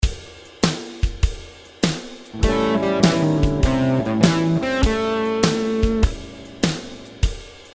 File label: blues note passing, guitar; 3 essential notes; better blues solos